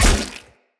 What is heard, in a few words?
Sphere Polearm Medium Impact Flesh